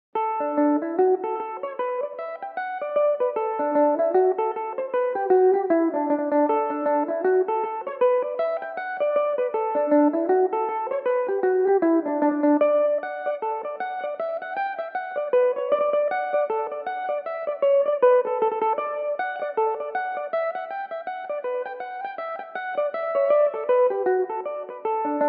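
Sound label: D major, Irish mandolin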